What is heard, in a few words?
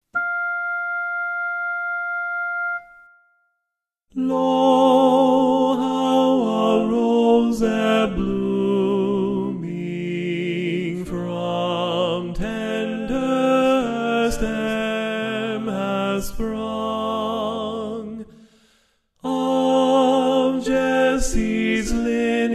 tenor